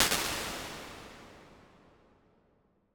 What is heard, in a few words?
Halls Long Synth Hall; Impulse Responses; Ableton Live 12 Lite